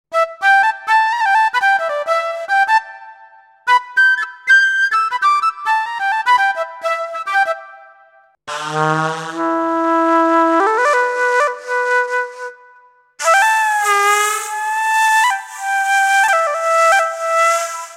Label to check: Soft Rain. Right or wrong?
Wrong